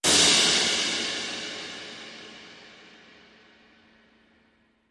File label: Cym Warning